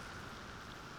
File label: Background Noise